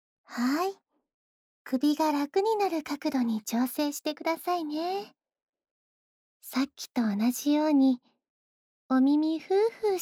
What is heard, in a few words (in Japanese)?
お耳ふーふー、左耳; 一番奥までふーふー、優しくふーふー♪